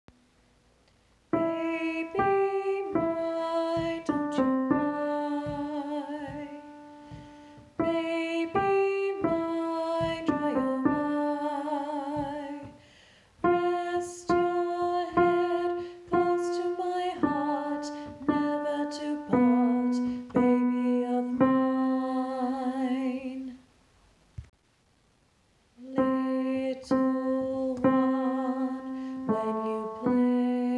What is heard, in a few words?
harmonies